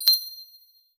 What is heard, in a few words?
ui success ping crisp; rise